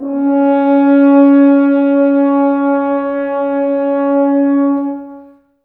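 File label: Brass French Horns, Woodwind Brass Ensemble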